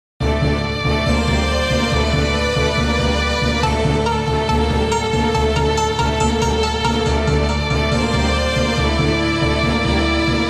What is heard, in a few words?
Scifi Theme